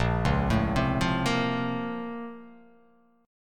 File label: Bb Minor Major 9th; BbmM9; arpeggiated; 6,4,7,5,5,5,x,x; JGuitar